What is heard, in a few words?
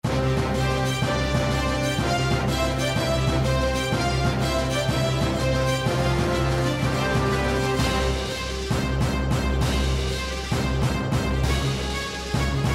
open music only; fiesta de peliculas